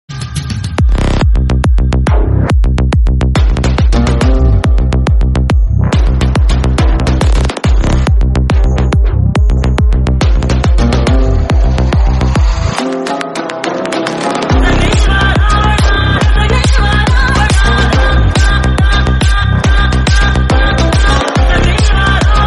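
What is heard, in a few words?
anthem background music ringtones telugu; ravanasura; raviteja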